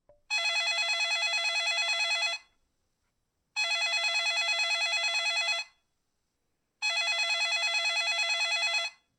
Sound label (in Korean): 전화기